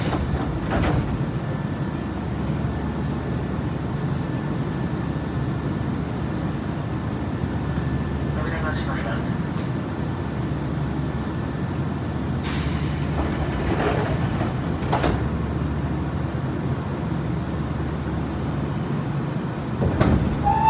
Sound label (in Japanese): 南海高野線帝塚山東, train sounds